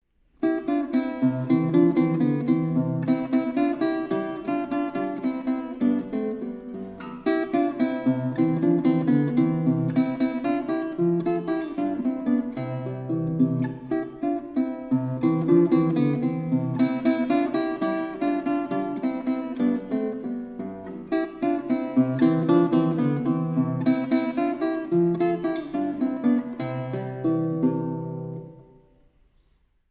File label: guitar; Rondo In C Ms95; Paganini